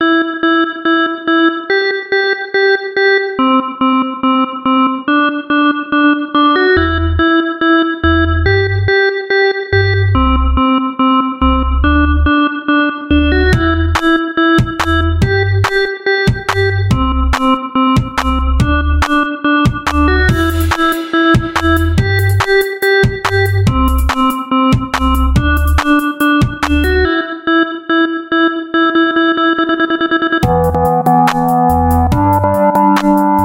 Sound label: Instrumental; lolicore; J.W.Records